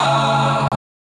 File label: Key chant; Luxembourg Zwee